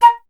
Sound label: FLT FLTA#, Flute; Miroslav Vitous - Solo Instruments VOL-2